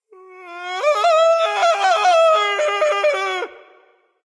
fear, voice; Smite; ymir skin12

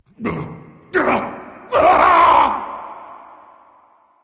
nv fear; smite; awilix

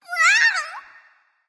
NV Ouch; smite; awilix

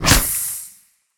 snake hit; Smite; Baron Samedi

